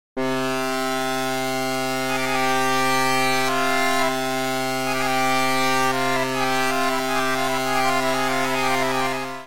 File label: harmonium